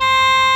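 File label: sheng C3; AKAI S6000 CD-ROM - Volume 1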